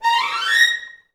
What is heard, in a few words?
VI RNMI A#5, Advanced Orchestra; Best Service